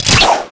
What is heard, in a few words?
kiting pull down